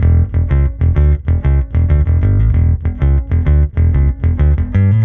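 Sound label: Electric Bass Neck Pick 95 E2; musicradar; bass boutique; 95bpm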